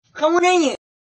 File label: Alert Notification Sms; Kamu Nanya